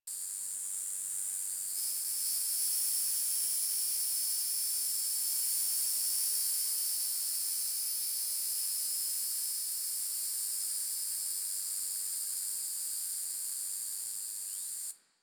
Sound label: insects high loop; Ambience; Props; Loops; Warframe; Eidolon Plains